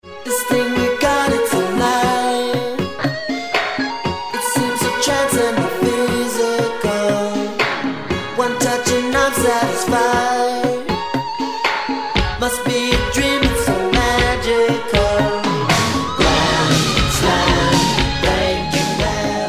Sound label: roland d-50